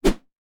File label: Fast Whoosh Bamboo Swoosh Through Air